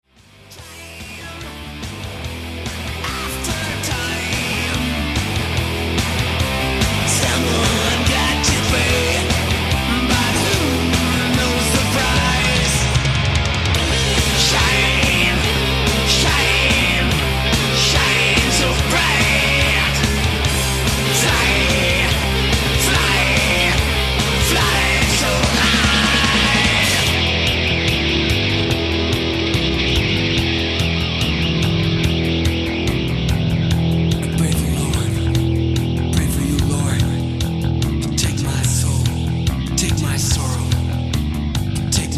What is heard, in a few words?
techno